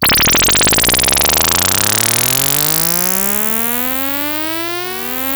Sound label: Moog D#3; Hollywood Edge; Giorgio Moroder Rare Synthesizer Collection; MLTI.MOOG